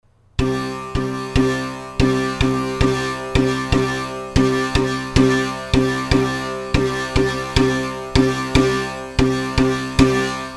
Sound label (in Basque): Ttun ttun erritmoa LA 100 BPM